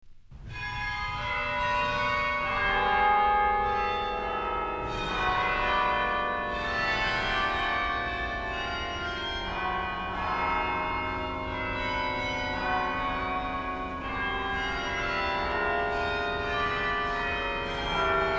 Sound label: Music for Carillon; EMI Electrola